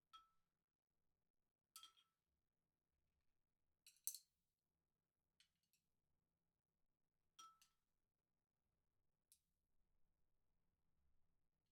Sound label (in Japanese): 食べる 時の 音; KU100